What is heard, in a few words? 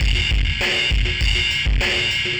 ind dist ride